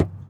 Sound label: Ticket Counter Perc; Houston; Moody Park